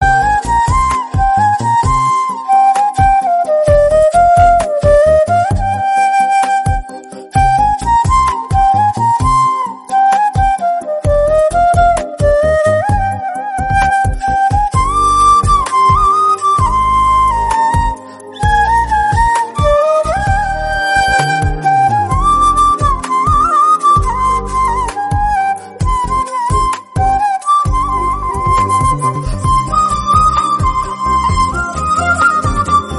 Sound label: Flute; Majili